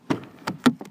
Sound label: Car Door Opening